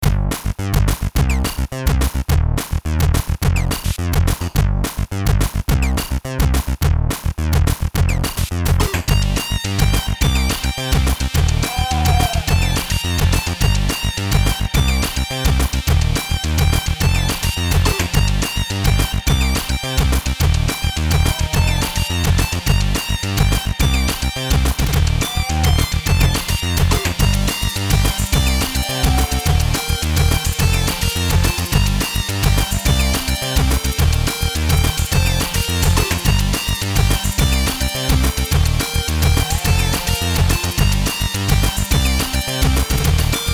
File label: Sheep Song